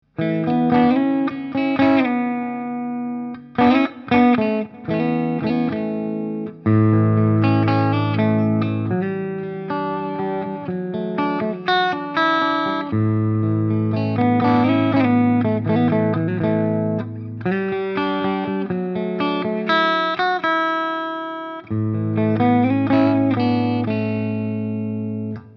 Mitte

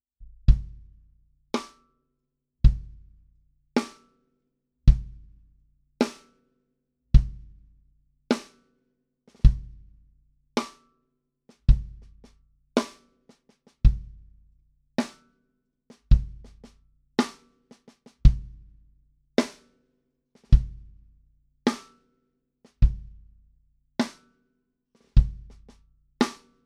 SIB Rocket Two Snare mit Bass Drum

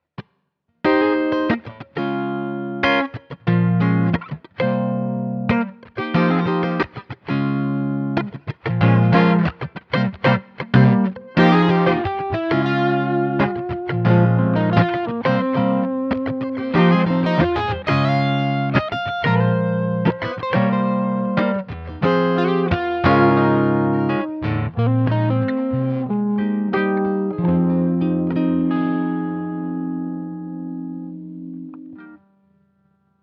Nanomag und Hals PU

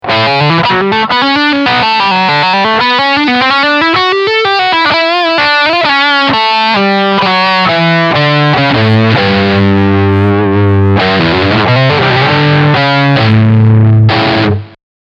Lead Steg SC

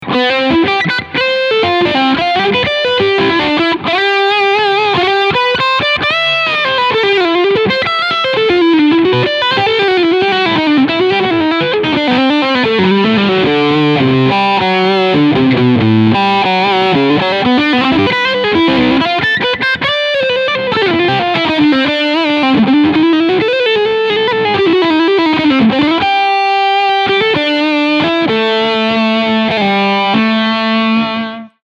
Harley Benton Fusion T HH Leadsound Steg Pickup

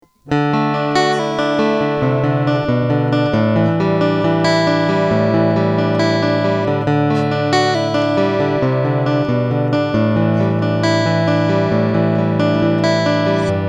Ibanez TCM50 EQ Mitte Picking

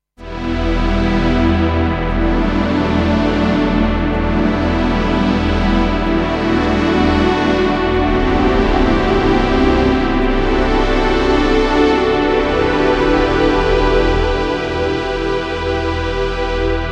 Pad Release Time 4 sec minus 0 prozent gleich linear Phrase

